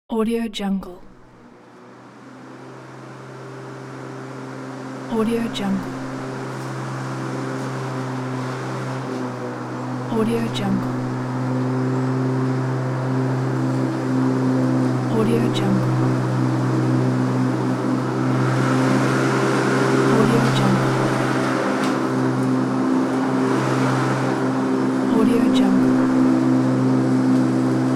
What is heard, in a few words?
uk garden lawn mowing